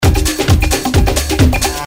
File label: Bangin Loop, Sony NY Dance